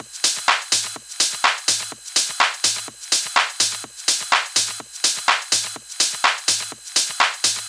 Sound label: Drum Loop 125 Top